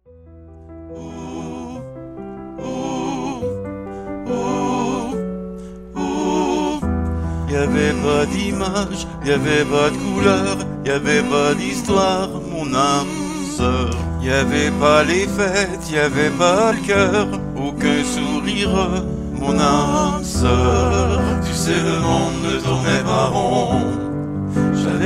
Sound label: SATB